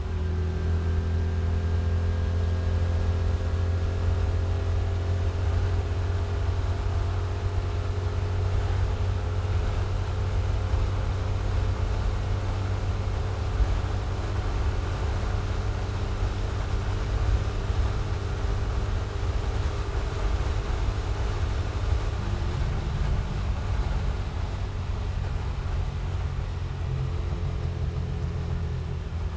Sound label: Citroen 2CV Mod Highway drive Cabin back seat roof closed, Racing Car with Modified Engine; On The Fly Audio